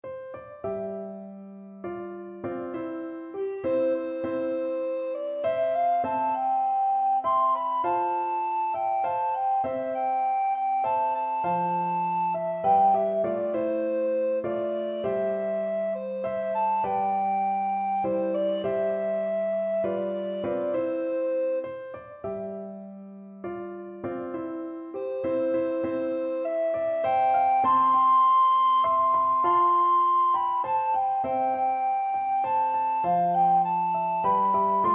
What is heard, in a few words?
shenandoah, alto recorder